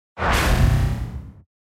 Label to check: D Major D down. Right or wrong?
Wrong